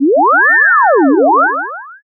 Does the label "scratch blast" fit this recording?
No